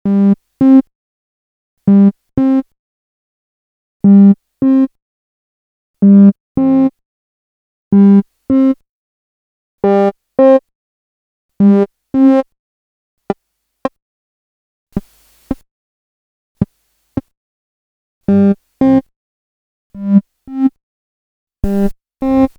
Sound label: moog satellite presets